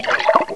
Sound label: Pump Squirt; Crystal Crazy Demo; Casady & Greene